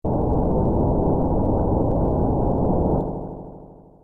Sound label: earthquake low; ff3us